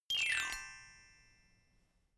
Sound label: notification glockenspiel slide down soft